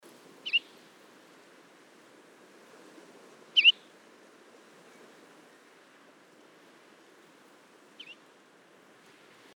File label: srshank; serb becken